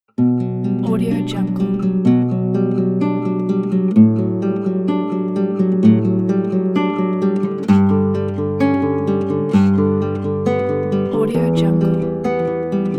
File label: emotional classical guitar